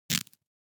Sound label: Cloth Split Rip Tear - Short - Foley Cloth Movement; Hollywood Edge; sound designer's toolkit v2